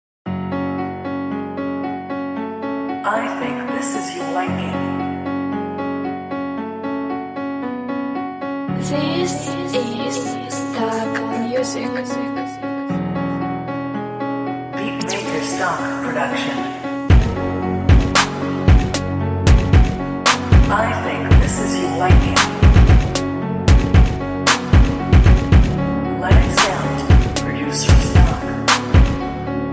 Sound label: Stock instrumental Beat